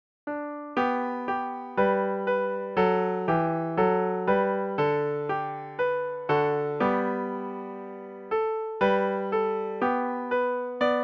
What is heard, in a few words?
piano